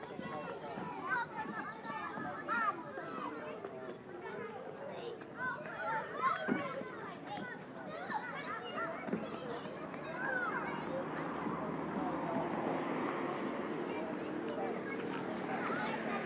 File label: schoolyard chaos